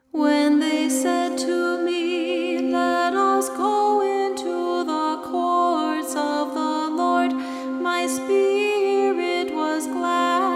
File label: antiphon